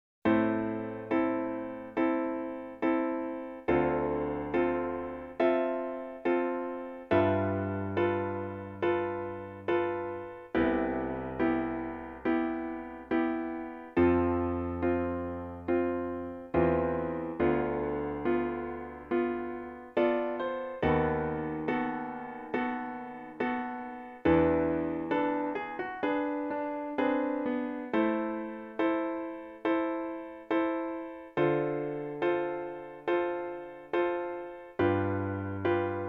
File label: ave maria clarinet a-moll; caccini